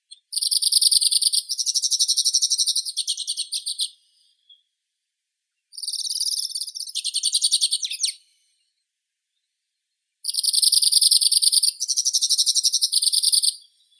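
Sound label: white-winged crossbill, loxia leucoptera; klest belokrilii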